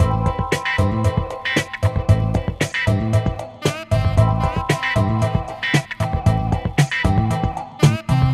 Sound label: A Smokin Beat